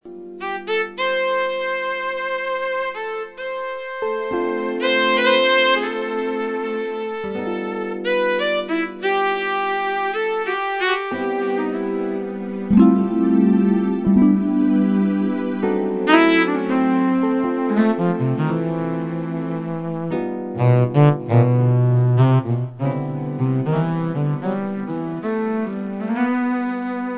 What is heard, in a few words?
sax